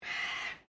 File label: Bird Cockatoo Sulpha Crested, Australian Cockatoos; Birds (Sound Effects:Animals)